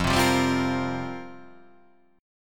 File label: F Suspended 2nd Suspended 4th F down